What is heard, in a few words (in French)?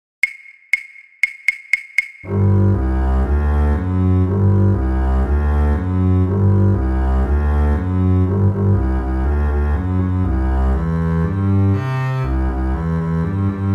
Rythme de Contre Basse